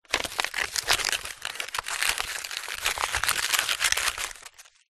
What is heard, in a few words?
industrial sandpaper movement hand